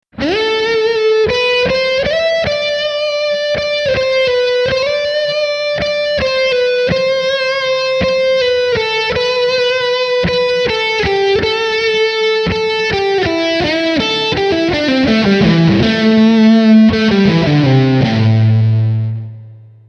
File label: Dirty Middle